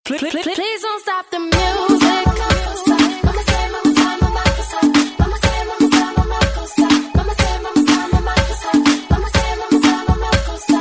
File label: music tone